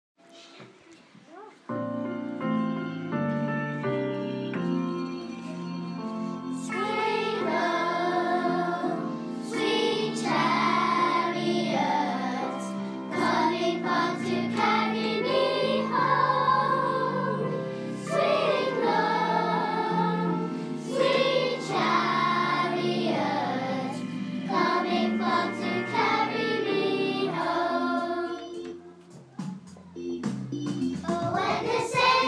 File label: gospel medley partner song